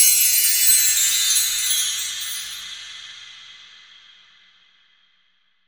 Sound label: Bell Tree 70Bpm; Lo-Fi; HipHop; Construction Kit; STEMS; Ghosthack; Ghosthack Advent Calendar - Day 4 - Lo-Fi Kit